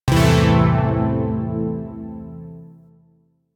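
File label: Shawzin Pentatonic Major Chord A; Zariman Shawzin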